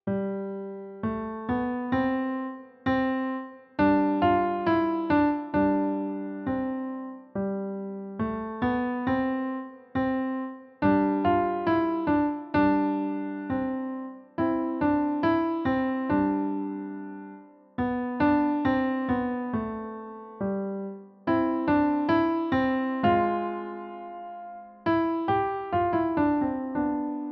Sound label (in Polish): fortepian; Gdy sliczna Panna; 9 swiatecznych i zimowych melodii na fortepian cz II